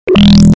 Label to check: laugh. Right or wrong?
Wrong